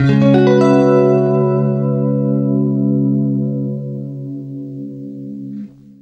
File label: CLEAN C#M9 R, Guitar; East West; Steve Stevens Guitar Samples Collection